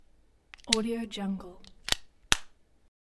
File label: wireless headphones in case